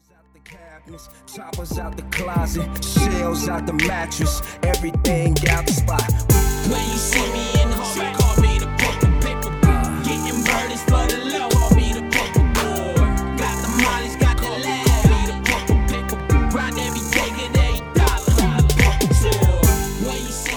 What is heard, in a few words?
hiphop teaser mix